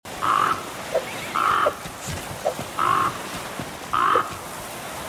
krähe; The Flame in the Flood

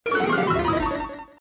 heuler; Pokemon Let's Go Pikachu; gamesoundopedia

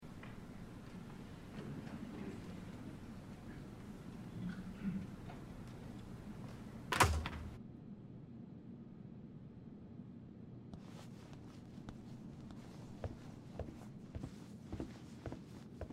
church hintergrund; Life is Strange 2; gamesoundopedia

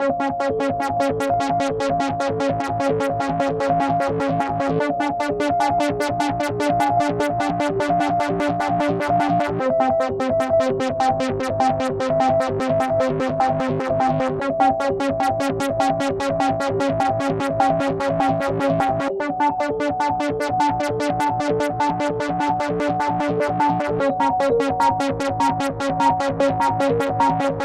musik; portal 2; altes aperture

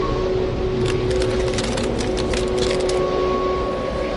erfrieren; We Were Here

